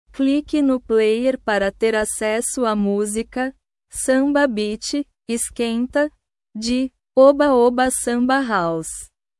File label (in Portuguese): samba beat esquenta